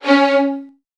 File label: C#1, Strings, VN 16TH; ILIO; Kirk Hunter